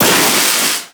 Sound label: Zap Fire; Trapper